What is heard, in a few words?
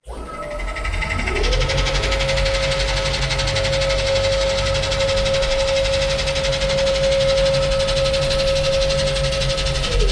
designed mecha evolutions hangar; sonniss gdc